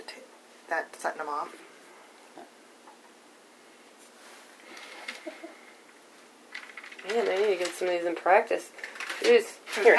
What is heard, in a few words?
giggle; howell residential